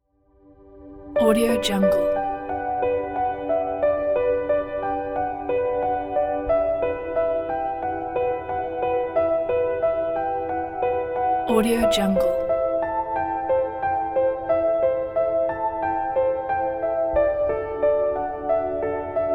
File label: warm piano inspiration